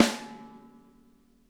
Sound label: snare, drum kits; Sonic Reality; Interactive Drum Kits